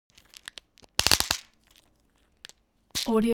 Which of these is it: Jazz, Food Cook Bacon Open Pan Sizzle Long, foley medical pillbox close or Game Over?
foley medical pillbox close